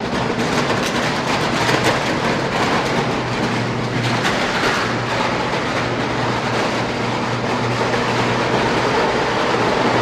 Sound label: Banging Machines Am, factory